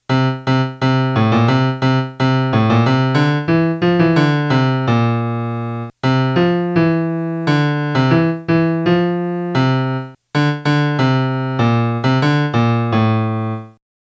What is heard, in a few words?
melody